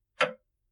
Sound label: Tick; Spookystation